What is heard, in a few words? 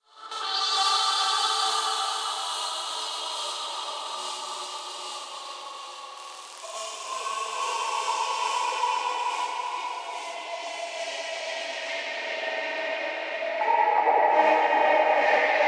Energy Thread Music; Warframe; Apostasy